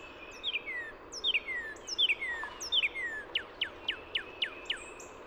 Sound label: wetlands noca